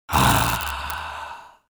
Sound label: Sighs Male; People